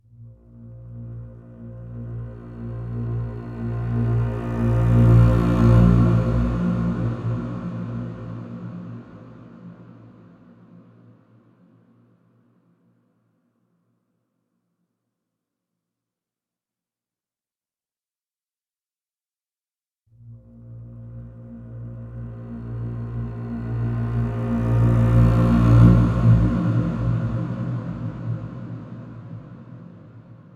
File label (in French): Contrebasse; SparkVerb